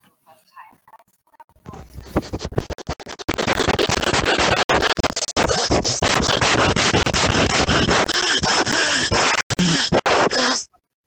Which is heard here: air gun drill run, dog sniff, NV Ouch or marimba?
dog sniff